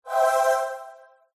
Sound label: hint reveal